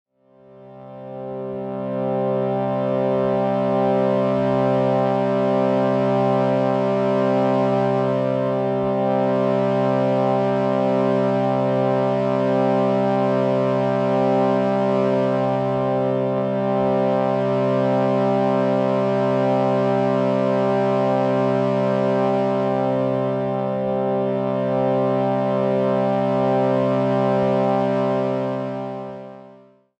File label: Shruti Box C G C 440Hz